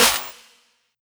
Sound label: Snare Clap Combo, drums